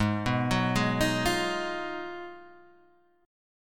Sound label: Ab Minor 13th, Ab, Guitar, Standard; Abm13 arpeggiated; JGuitar